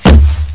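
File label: crossbow fire, weapons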